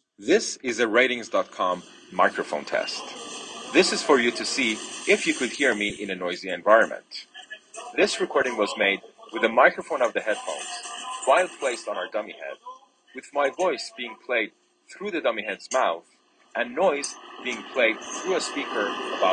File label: iphone subway